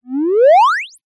cartoon rise grow increase whistle

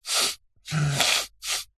human male middle aged sniffing

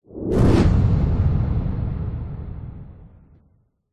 sound design whoosh into weak impact; zapsplat

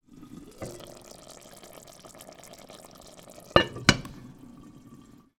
food stew pot lid open boil close; sound effects one

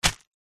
foley footstep single on plastic sheet

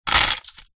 industrial chain metal medium pull over metal

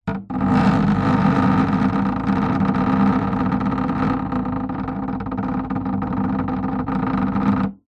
wood hollow vibrate fast object; foley; zapsplat

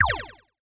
science fiction laser; sound effects one

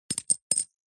leisure game plastic small discs set down; zapsplat

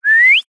human whistle mouth short; zapsplat

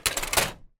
office printer paper tray close

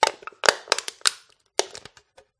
pub can crush; field reports

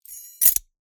Keys Throw Up and Catch in Hand

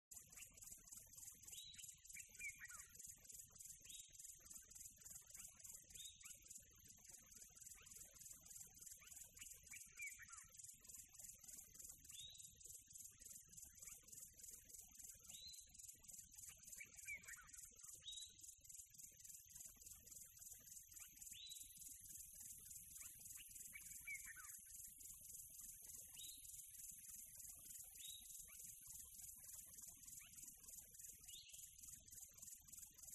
ATMO NATURE Quiet Tropical Birds Atmo; smartsound

